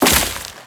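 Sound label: Crewman Step Vegetation, Footsteps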